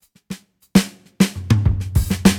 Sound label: Drum Fill 100 BPM; Drum Loops; Abbey Road Drum Fill; Cymatics; Cymatics - King Drum Loops (Teaser)